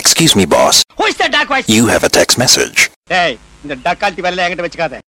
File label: Tamil Song Ringtone